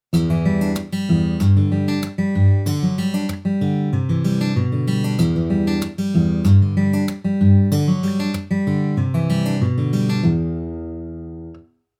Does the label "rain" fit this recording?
No